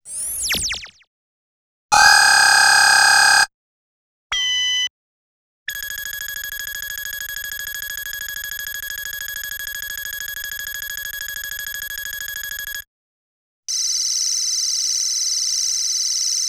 Bleeps Assortment, Electronics; Sony Pictures Sound Effects Series Volumes 1-10; Sony Creative Software

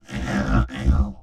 Effected Snarls, Vocals Humanoid; Sony Pictures Sound Effects Series Volumes 1-10; Sony Creative Software

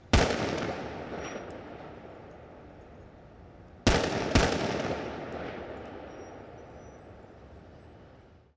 Pistol Shot With Shell Casings; Weapons & Firearms; Sony Pictures Sound Effects Series Volumes 1-10; Sony Creative Software

